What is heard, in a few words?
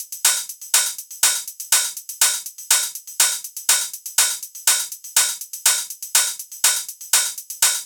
Deep House 122bpm Hihats; EDM Drums; Drum Kits; Stems; Ghosthack; Ultimate EDM Drums; Deep House Kit 10